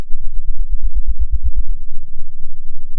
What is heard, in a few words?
pop, Sound of a thunderstorm